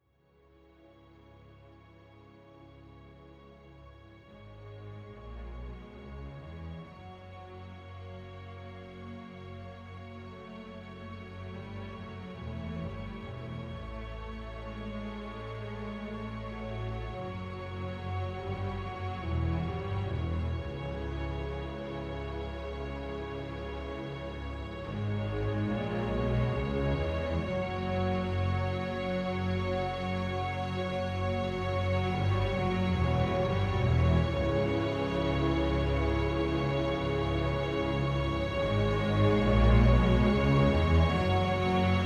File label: orchestral vastness